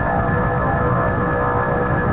A-10 Engine; A-10 Attack! DEMO

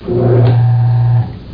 floodgates move

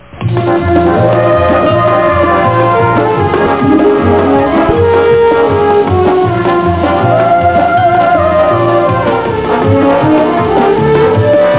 end music; Zoom (1995); Active Software, Ground Zero

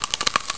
CRUNCH

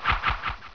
Knife; Curse of Dragor

